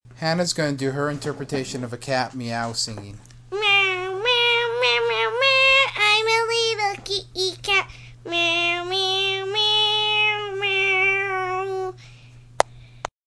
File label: singing a meow song